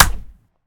Maneken Kick; Game rip; MiSide

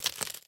Interface Take; Game rip; MiSide

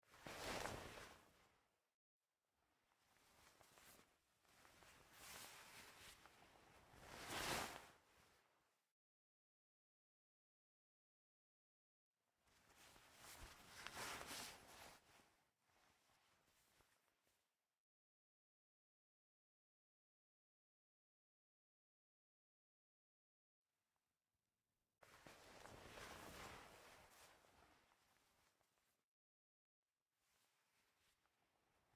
Player Hide; MiSide

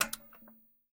Lamp Switch; Game rip; MiSide

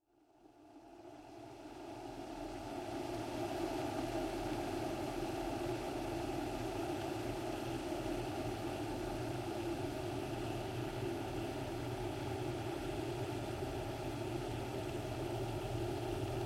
Kettle Water Hot; MiSide

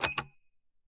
Button Safe; Game rip; MiSide

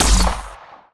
Shot; MiSide

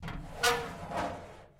Switch Start; MiSide

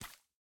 Step Blood; Game rip; MiSide

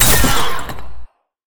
Spider Kick; Game rip; MiSide

